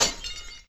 glass broken; Swift Cats

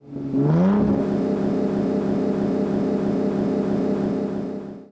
turbo; DeadlyRace

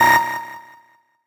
lap; Formula Racing

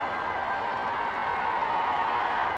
cheers normal; BattleBattle

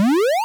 petrol; DeadlyRace

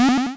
checkpoint flag; Season Land

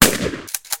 shotgun shot; DuckShooter

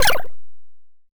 boss shoot; Season Land

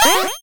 pickup; VirtualBoyEscape

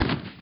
explosion normal; ChristmasFurious

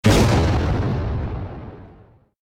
bomb; BrickOut

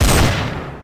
pistol; Zombies Night

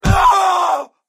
death player; Viking Escape

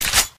ammo; Zombies Night

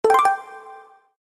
button; Viking Escape

